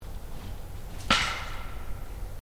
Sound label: Fiskars Clap